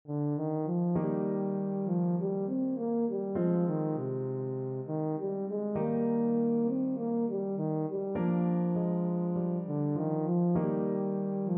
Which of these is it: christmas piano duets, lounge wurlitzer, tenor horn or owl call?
tenor horn